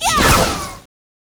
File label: attack; Despreado; Mob